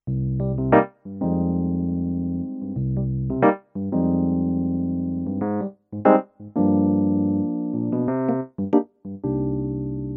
Kross E-Piano

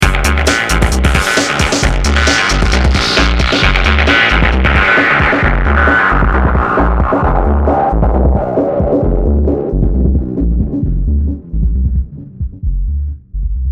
V Filter

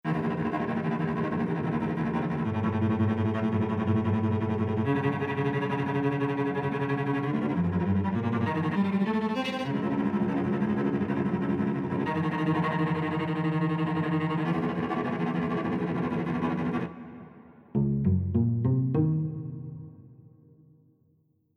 T2 cello clean

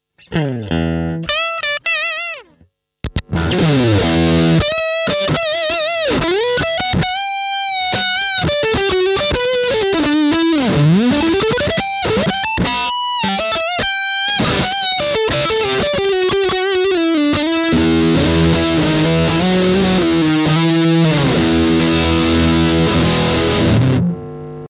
BM20 ULTRA Gain max LEAD

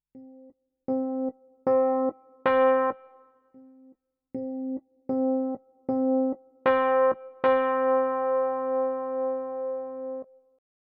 NU1 EPiano Velocity

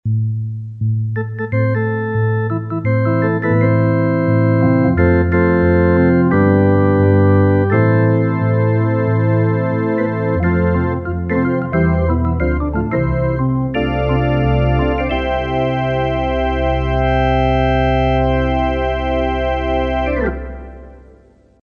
Flute Celeste

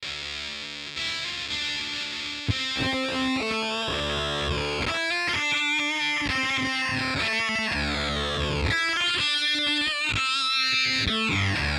4046 m Bitcrusher; Industrialectric

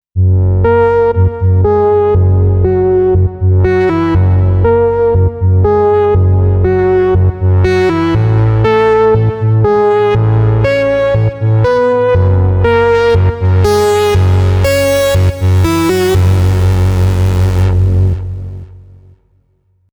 Roland AX Synth Growl Lead

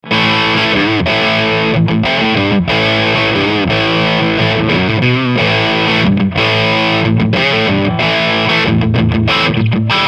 Crunch Riff

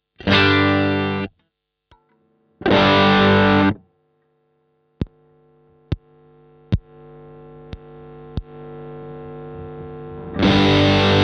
Gain max Knobs Off On; BM20 ULTRA

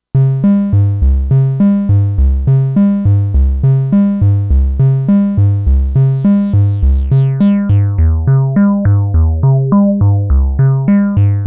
Behringer Modul 110

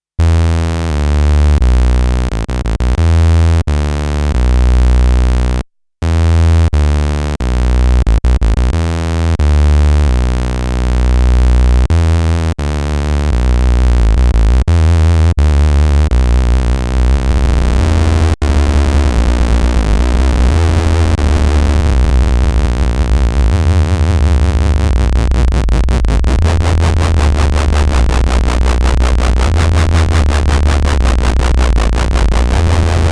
Vermona; Vermona 14

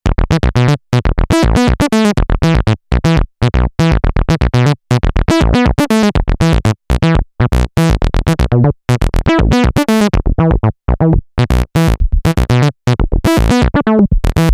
Subsequent 37 ACID

